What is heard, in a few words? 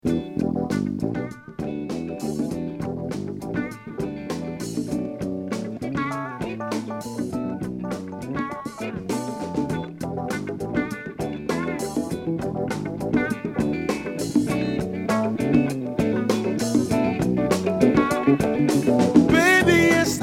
Bell